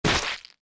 Ink Burst

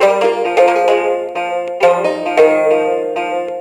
Banjo Ambience; Slime-san

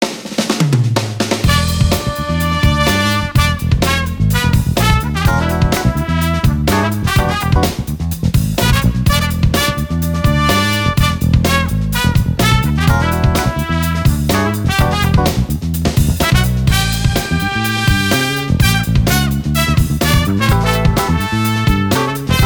Music Country Club

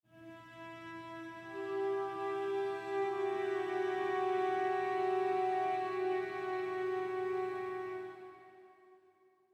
Melody Mood; the last of us; Apandt J and S Melody Mood 3

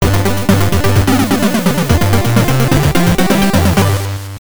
Level Entry; V-Rally Championship Edition; GB

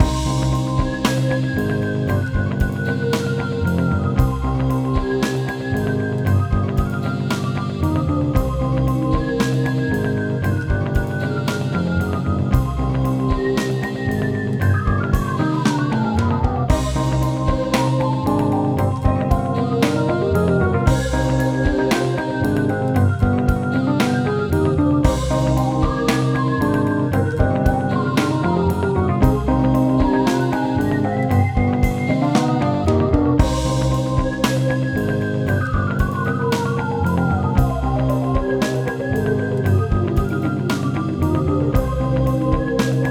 Spooky Forest; Chicken Blaster; Wii

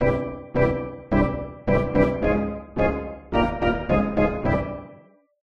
Ditty

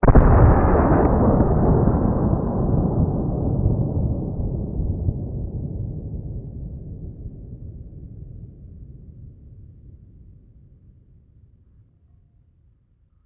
Factory Explosion; gamerip; Plants vs. Zombies Garden Warfare 2; PS4, Windows, Xbox One

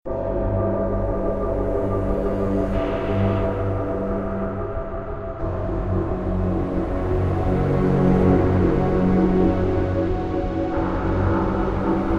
Exploration Hostile

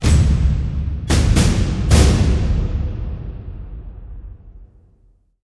music Victory Stinger

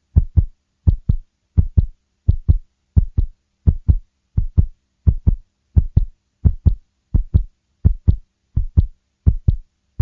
Heartbeat; Skautfold Usurper

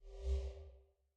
Desert Mech Node Solved G4

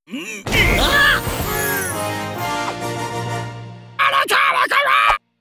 Get Gear Fanfare; Donky Kong Adventure

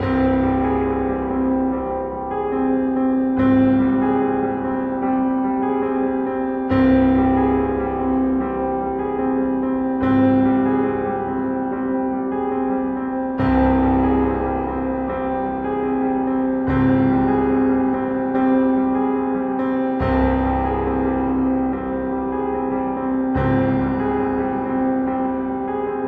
Classic Horror Loop

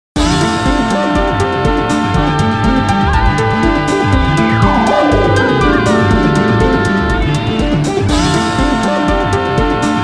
Darkness Battle Victory; eastern mind chu teng macos windows gamerip 1995

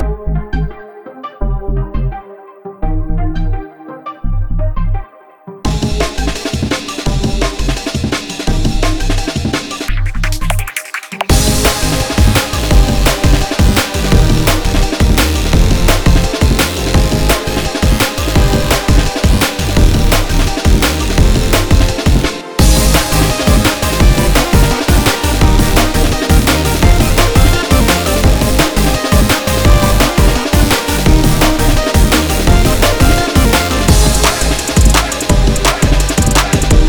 Instrumental; Friday Night Fever Frenzy Side Stories; Space Demons

